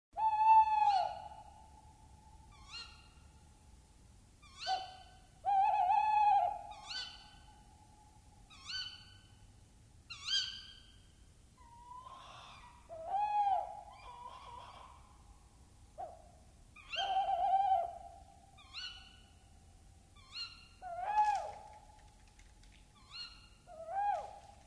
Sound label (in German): Waldkauz